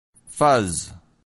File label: fuzz